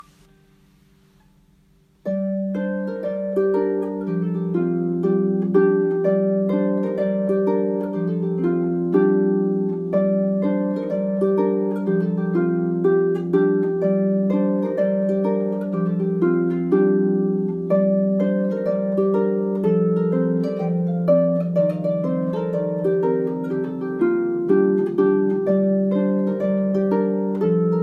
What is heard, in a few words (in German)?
Danse Fisel Bretagne, Harfe